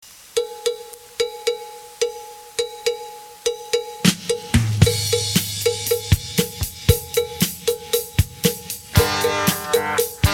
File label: Honky Tonk, varietyband